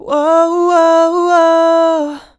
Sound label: "Wahwahwah", Male Vocals, Ad-Libs - C Major 150BPM; Ghosthack - Free Male Vocals and Atmospheres